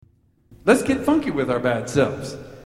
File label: lets get funky